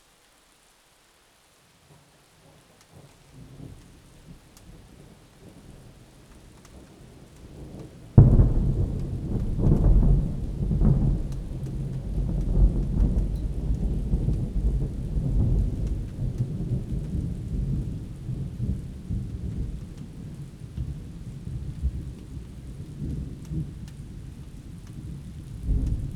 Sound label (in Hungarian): pukkanas, julius, mennydorges; angyalfold